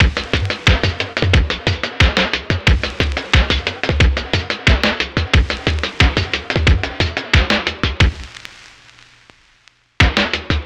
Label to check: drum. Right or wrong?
Right